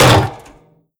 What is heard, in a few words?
vent step, headcrab reviver, creatures